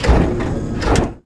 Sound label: elevator teleport; Tony Hawk's Project 8; XBOX